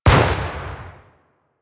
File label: Vial Blast Shatter; Warframe; DE; Warframes; Alchemist; Elements; Ability